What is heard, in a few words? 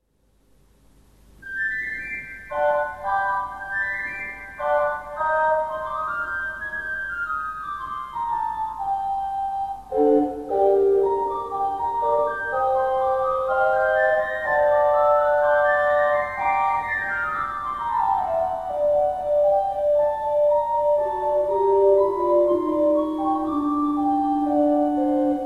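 Rohrflöte 4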